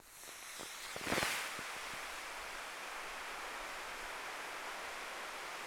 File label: smokegren, guns